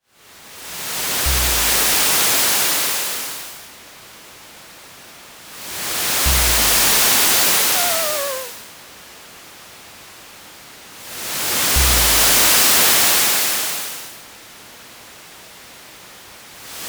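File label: ambience seaside small wave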